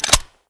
clip in, weapons

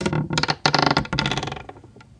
camp, ambience

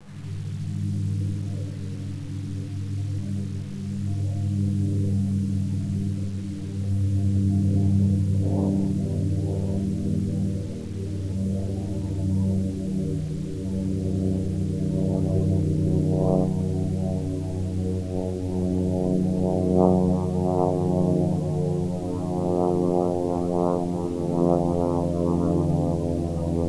planes

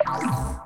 chain regular

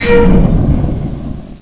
pipe burst

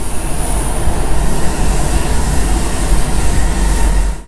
train

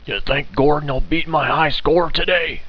beat score

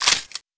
slide release, weapons; paranoia

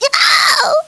ted pain

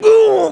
pain

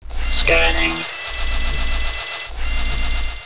gunner idle

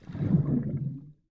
player swim

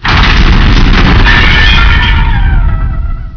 rebar smash

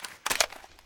clip out, ak47

